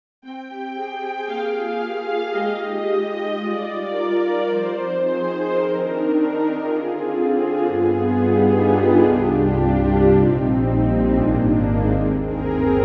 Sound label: Synth, Just Lush Pad; Synthogy